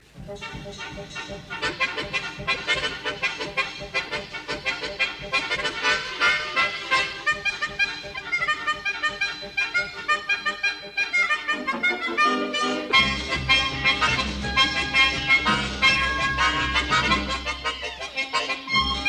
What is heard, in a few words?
Concertino for Harmonica and Orchestra Hora; Op 245a